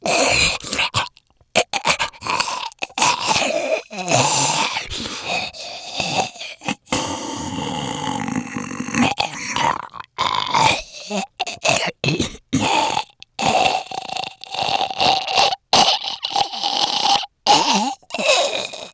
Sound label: Zombie